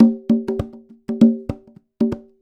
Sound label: Conga Loop (100BPM); musicradar